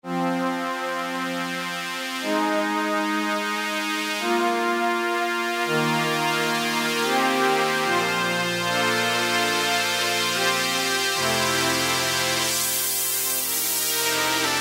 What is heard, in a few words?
Korg microKORG BPF 4th Pad; Factory Voices